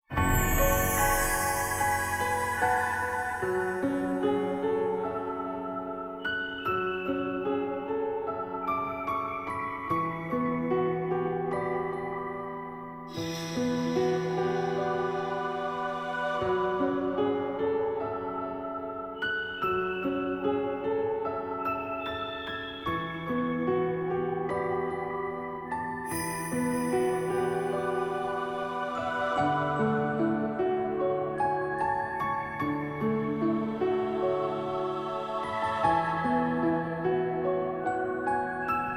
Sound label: Field Forest; Bravely Default Brilliant Lights